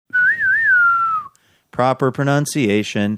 whistling saying proper pronunciation

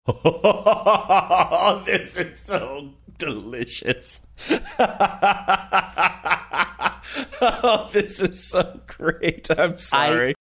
having good laugh saying this is so delicious

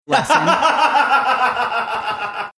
having a good laugh

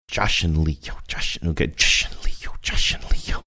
trying to beatbox

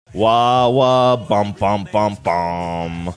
wah wah bom bom bom bom

